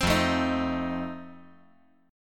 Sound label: F 7th F down